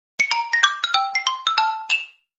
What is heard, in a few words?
funny message